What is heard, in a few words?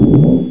BLOOMP